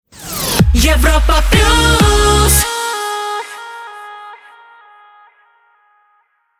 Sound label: Jingle Rapid Fire; Europa Plus